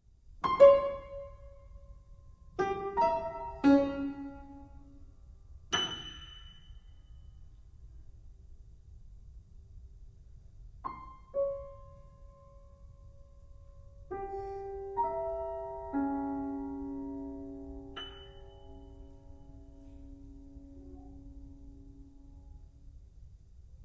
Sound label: circle music bsn